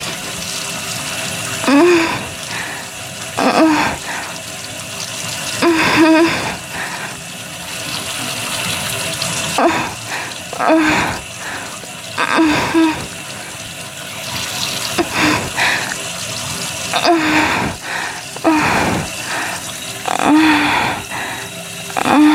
F4M Extra loud Water noise; In the bathroom

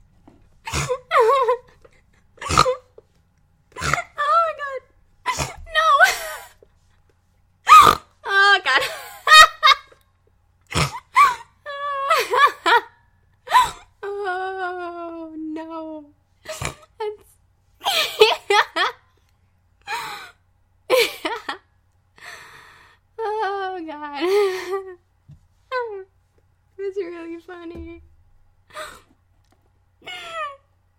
Miffy laughssss

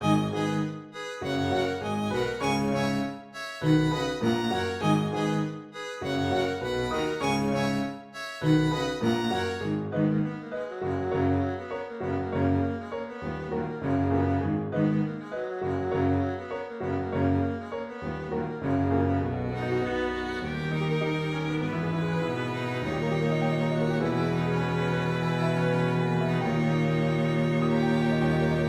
Remix Piano Quartet; Pony Music Archive

